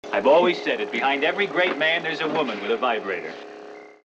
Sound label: vibrator